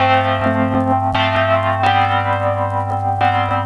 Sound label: Dm 131, Synth Loops, Acidized; 131bpm; Zero-G; Soundclash Nu Dance Electro